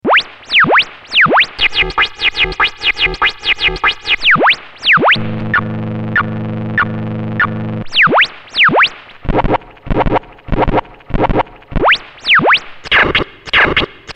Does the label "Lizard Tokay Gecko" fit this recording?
No